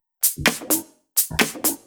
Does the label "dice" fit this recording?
No